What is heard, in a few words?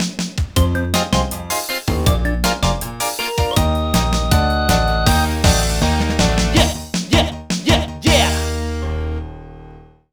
Big Rock Finish; Rhythm Heaven; NDS